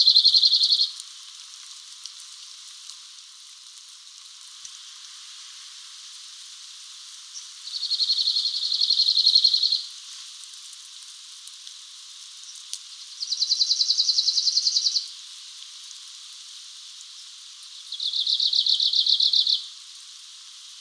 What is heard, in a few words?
Arctic Warbler - Phylloscopus borealis; Arter